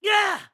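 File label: Sword Spin, voice; BOTW; Breath of the Wild; Link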